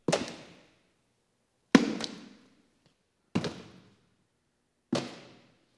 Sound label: Police, Fire engines